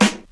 00s warm hip hop acoustic snare sample g sharp key